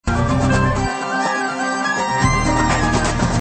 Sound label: Romatic Flute